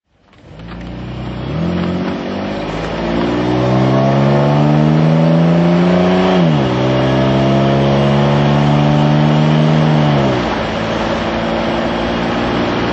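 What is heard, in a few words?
jaguar xk140 dhc auto drive, Engine sound driving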